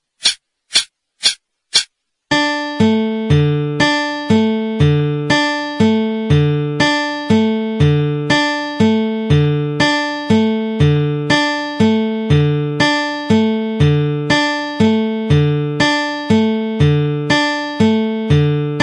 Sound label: D Fingerstyle 120